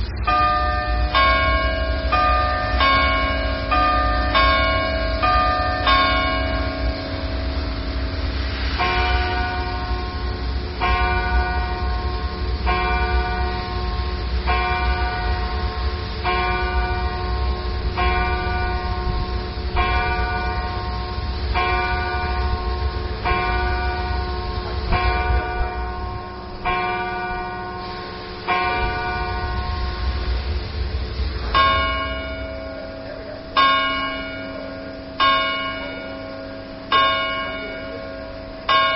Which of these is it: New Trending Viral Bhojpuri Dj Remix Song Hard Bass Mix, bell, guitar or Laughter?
bell